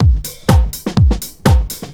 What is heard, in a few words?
GROOVY 125 BPM, loop; 122-146 BPM